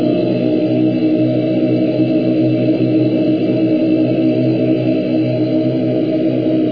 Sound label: monks; hl1mp; persia